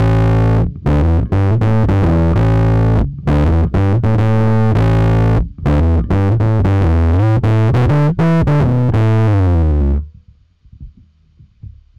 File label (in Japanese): DIS 指弾き